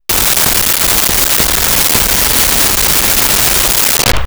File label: Machine Gun Bursts; Weapons & Explosions